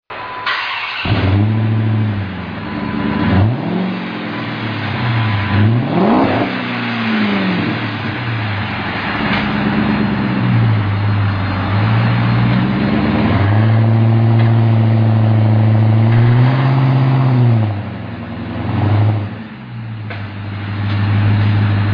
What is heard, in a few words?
Charger Hemi Magnaflow duals, exhaust sounds